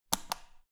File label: power button turn on off hard quick